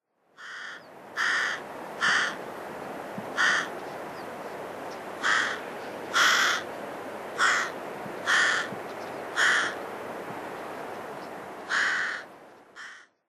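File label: Carrion Crow; nemuro; koe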